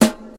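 studio snare drum sample a key, tuned to the A note; hq studio snare drum; snare-drum-samples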